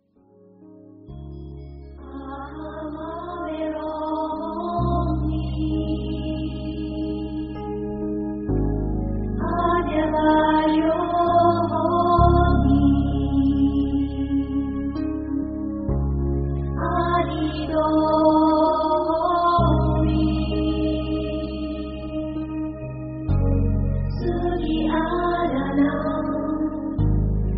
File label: buttery loop